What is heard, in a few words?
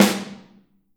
Drums Percussion